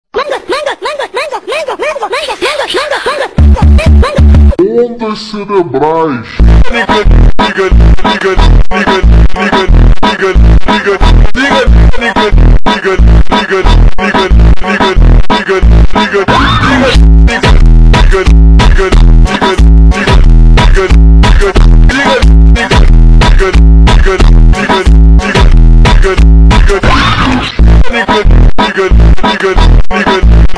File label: MONTAGEM FUNK, Slowed Reverb bassboost